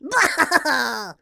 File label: Lapin Succes Bwahhahahaaah; Rabbids Coding